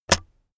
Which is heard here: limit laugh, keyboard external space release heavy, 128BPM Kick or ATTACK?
keyboard external space release heavy